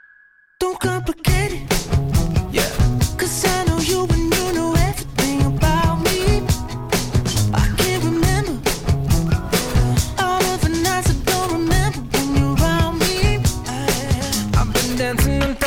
ringtone

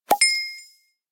pop orb ding; google

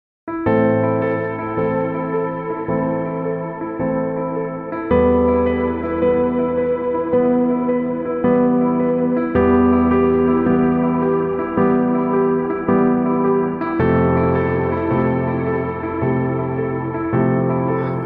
love sad

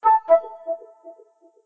Flute, ringtone; xiaomi

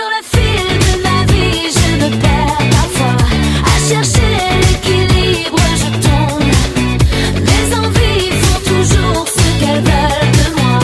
ringtone

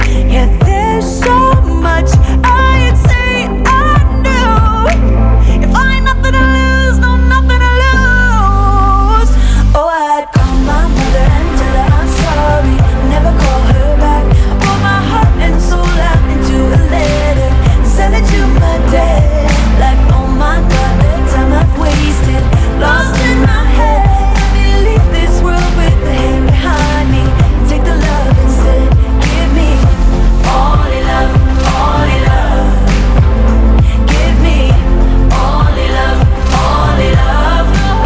Only Love

